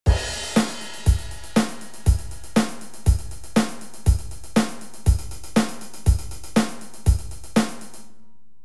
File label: drum